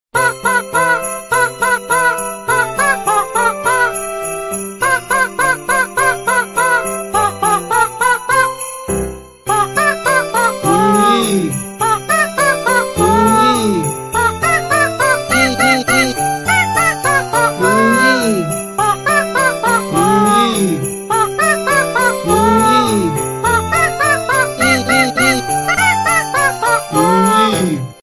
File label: Jingle